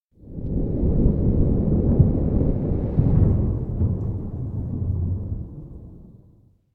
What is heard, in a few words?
elec sky low resonate; anemoi